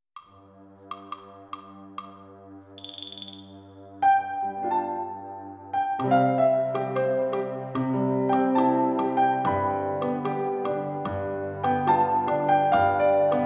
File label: Tango